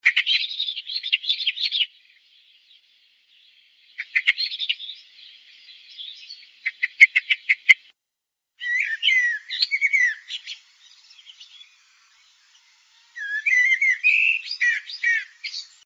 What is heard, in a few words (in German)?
amsel stimme; So klingt die Amsel